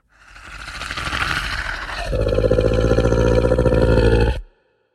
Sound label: monster snarl and growl